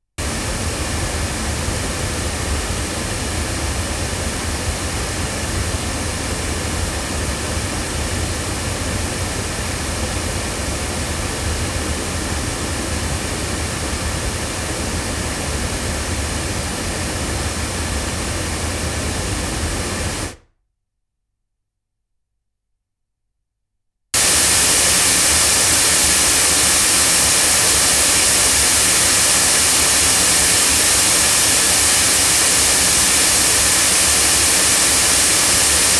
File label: loudspeakers; LineAudio SM3i; microphones